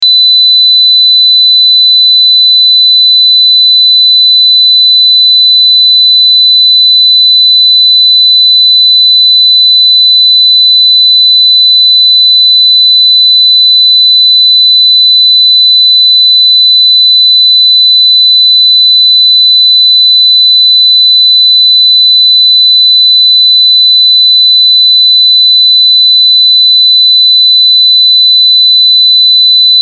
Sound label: 4KHz 0dB LR; LG Zero H650